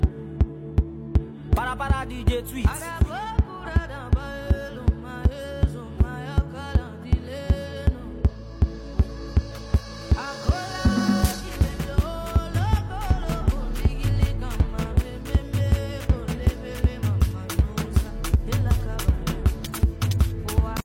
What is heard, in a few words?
Bola Beat